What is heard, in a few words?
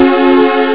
st chord; Amiga Soundtracker Sample Packs